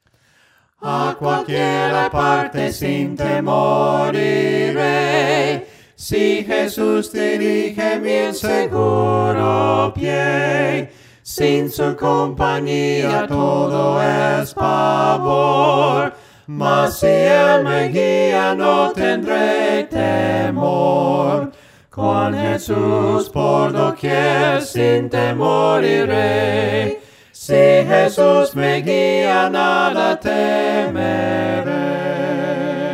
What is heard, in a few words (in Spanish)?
DONDEQUIERA CON JESUS VOCES, himnos a capella; cantos del camino